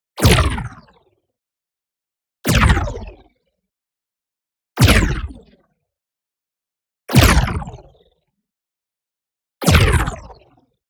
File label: IMPACT SMALL Laser Ripples; boom library; Matter